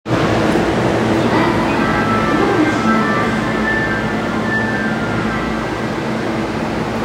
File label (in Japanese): 都営新宿線市ヶ谷東, train sounds